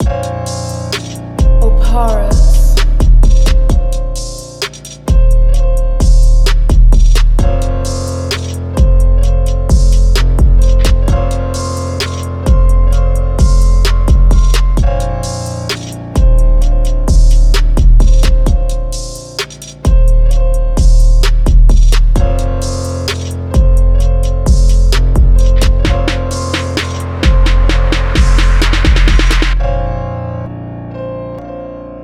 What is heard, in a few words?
65BPM, instrumental